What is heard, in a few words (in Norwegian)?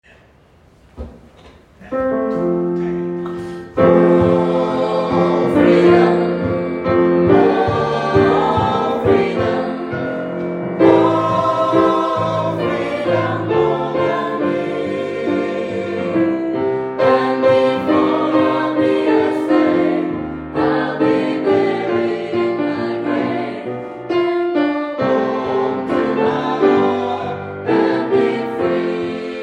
alle stemmer, gospel; Oh, freedom; kongsberg; kor; gospel for alle